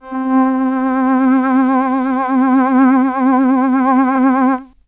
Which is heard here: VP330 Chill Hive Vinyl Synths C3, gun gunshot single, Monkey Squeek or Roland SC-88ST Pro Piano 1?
VP330 Chill Hive Vinyl Synths C3